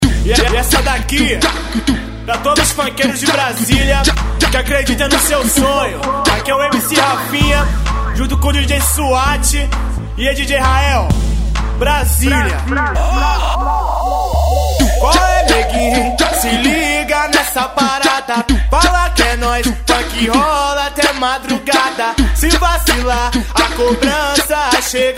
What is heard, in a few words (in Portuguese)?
baile funk